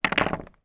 sport pool snooker handfull balls place in rack triangle